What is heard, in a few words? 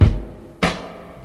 96 bpm breakbeat sample G sharp key, kick tuned to the G# note; drum loops